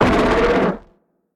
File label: attack; Barotrauma; Hammerheadgold